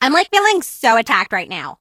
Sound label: hurt; emz